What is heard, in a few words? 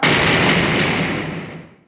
slamming jail door; Prisoner; Arrival